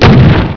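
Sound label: double barrel, scattergun; svencoop; classic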